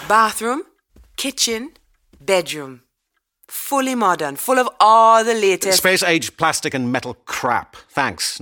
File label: space age plastic and metal crap; BigFinish; Departure and Arrival